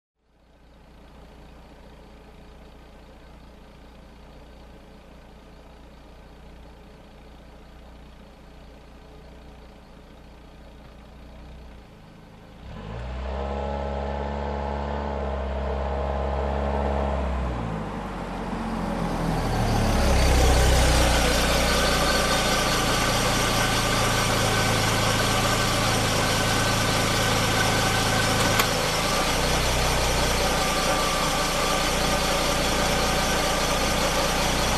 Car approaching and parking

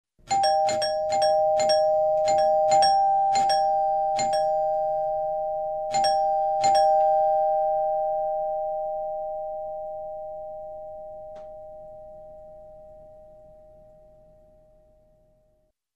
Doorbell ring multiple times

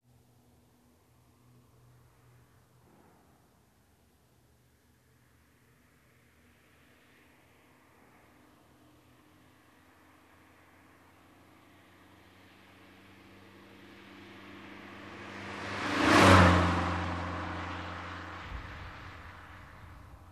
Car driving by fast

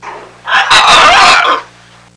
Macaw screaming

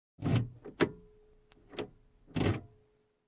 Car unlocking door

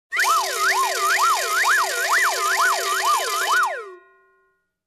Cartoon Dizzy with steel rumbling

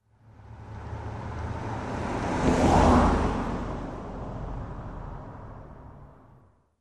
Car passing by on clean road

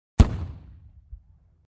Rocket Firework Blast

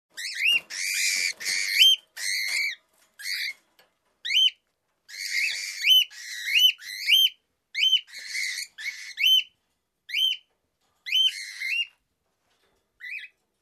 Hatchlings chirping

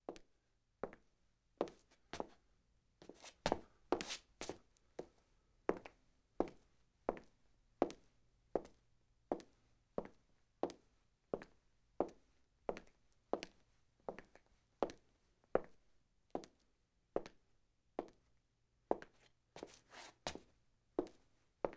Footsteps Linoleum Slow

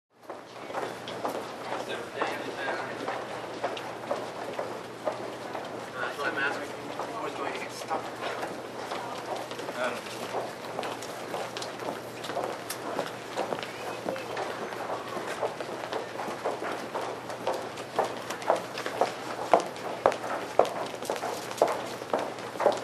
People walking in the office

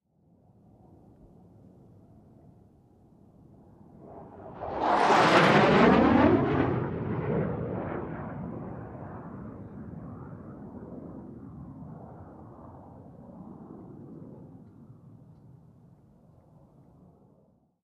Aircraft on a runway taking off

